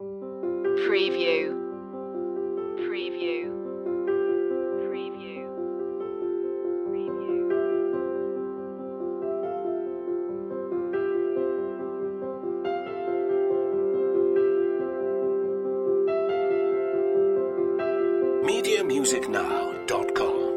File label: drops in time loop